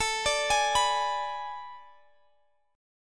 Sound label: B Minor 6th A; Bm6/A arpeggiated